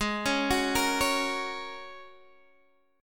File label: G sharp 7th Suspended 2nd Suspended 4th, Guitar, Open D; down; chord shape